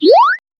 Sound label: cartoony super, upgrade